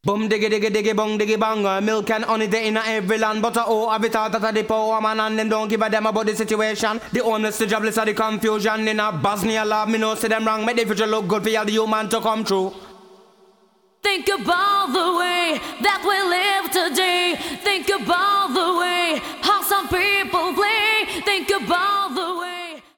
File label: Acappella